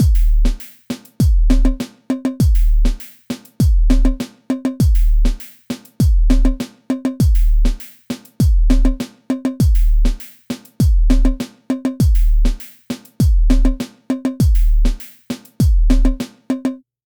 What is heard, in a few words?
afro beat loop 101bpm A minor